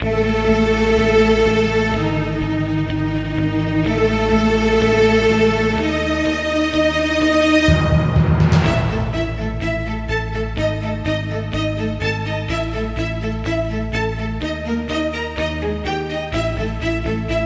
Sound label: TENSION